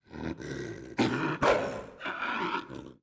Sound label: animals tiger 1200 cent